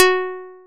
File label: plucked, programmable speaker